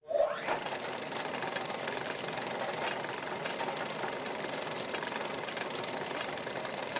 Fan Motor

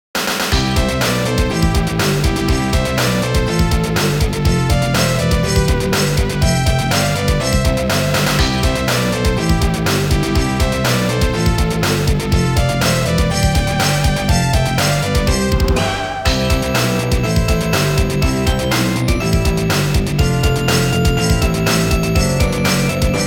Jingle Bells; Traditional

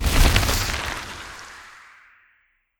Spell Enchant Brambles

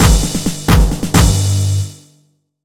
Fanfare

